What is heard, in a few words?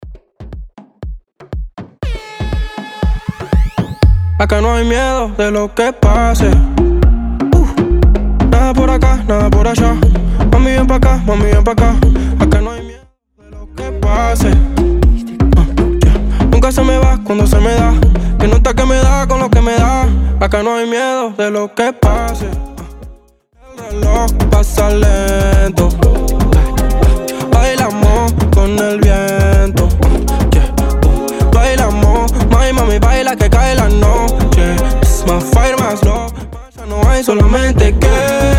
Intro Outro 120 BPM